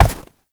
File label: Step Snow, Movement; Uriel; Warframe